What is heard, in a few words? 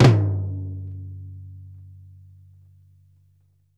FLAM RACK, TOMS; Sampleheads; New York City Drumworks VOL-1; SP TOMS